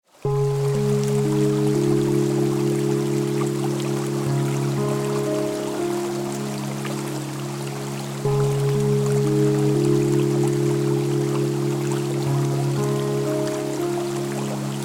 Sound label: Stream and Music